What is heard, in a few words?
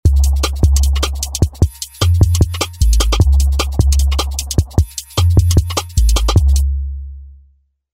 D&B Vibe KIT, Roland V-Synth; Factory Patches